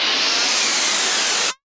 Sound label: Jet Sweep, Effects